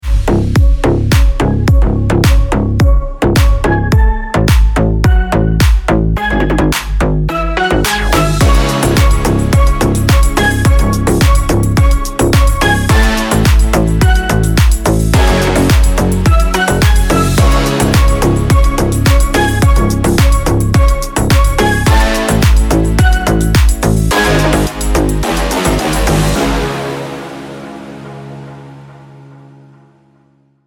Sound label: Brazilian Bass